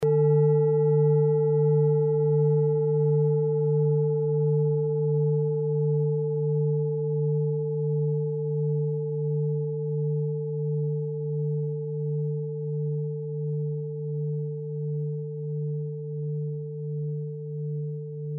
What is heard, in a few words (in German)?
klangschale ladakh; Klangschale Nr.24 Bengalen; Hörprobe der Klangschale